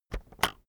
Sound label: keyboard external enter press slow